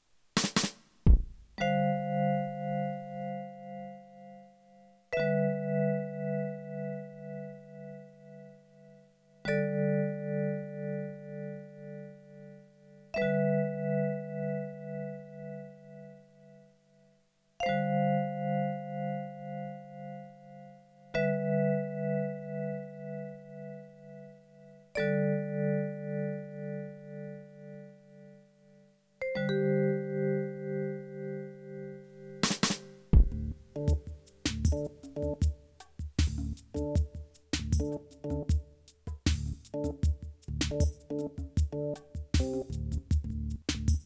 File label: Instrumental Back Half